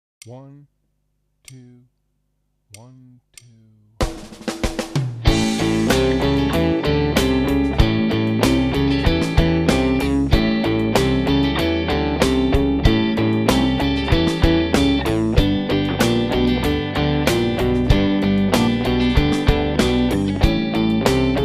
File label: It's Rock Time - Backing for Lead, Rock Guitar; Play Rock Guitar - Ed Wennink